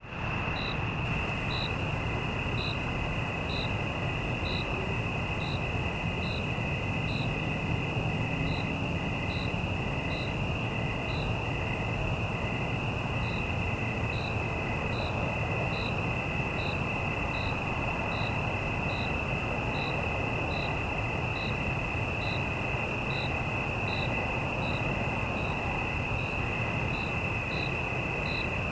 city night evening ambience single cricket cicadas distant traffic stereo; sonniss gdc